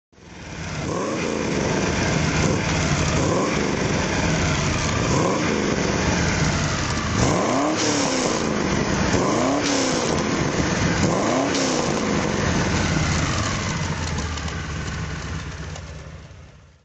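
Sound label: corvette stingray conv throttle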